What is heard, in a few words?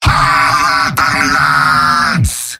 Demoman battle cry